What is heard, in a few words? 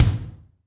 kick, Zoom MRT-3B